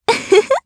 Laugh, jp; Aisha; kingsraid

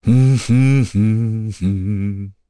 Vox Hum; Chase; kingsraid

